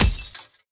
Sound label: car crash; factorio; Factorio 0.14.21